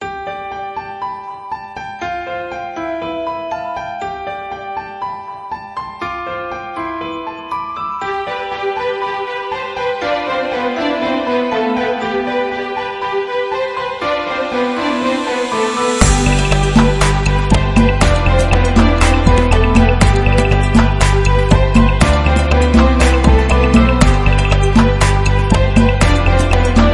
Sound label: Rise and shine ringtone